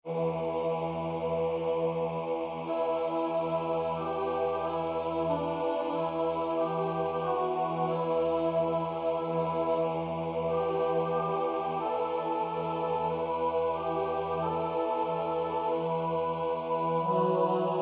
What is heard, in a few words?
SATB playback; Lord Keep Us Steadfast